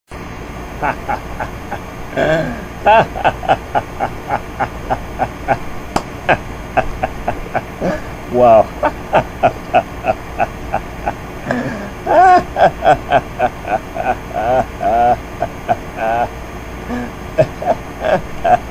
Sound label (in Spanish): risa falsa